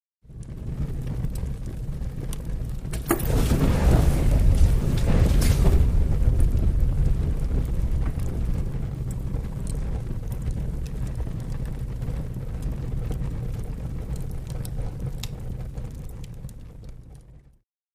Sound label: Lighter Fluid Poured; flareups